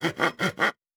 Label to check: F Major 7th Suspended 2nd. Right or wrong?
Wrong